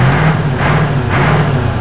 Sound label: car rev up; TRANSPOR